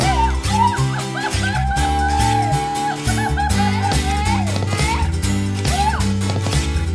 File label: rythm x bass; food world; inferno shooter; Rayman Origins